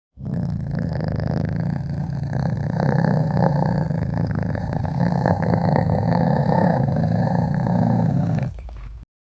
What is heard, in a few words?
a large dog growling soun